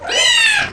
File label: ghost cube die